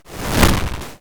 fighting game source whoosh synthesized fuzz motion arcade; 8 bit